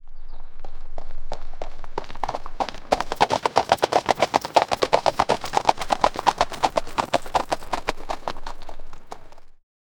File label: horse running in field